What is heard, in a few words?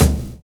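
BOND bass drum; Univers Sons - Kitch 70s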